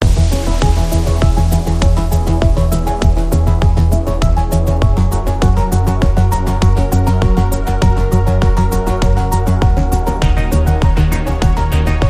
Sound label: Trance Synthesis Rhythm